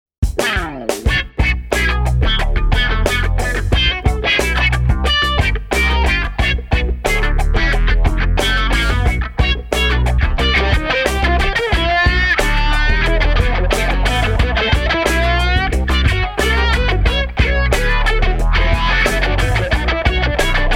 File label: Auto Wah PHUNK